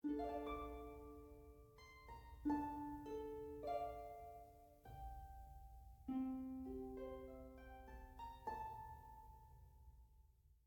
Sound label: harp